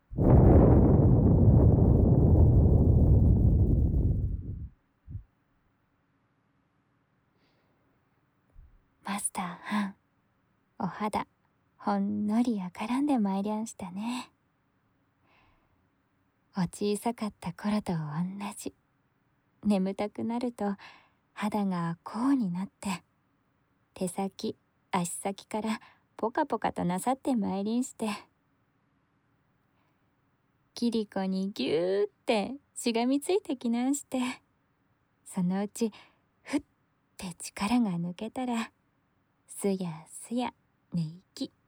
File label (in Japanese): 伊満里焼耳かきでの左耳耳かき; 陶器耳かき; 蓄音レヱル ～ 切子～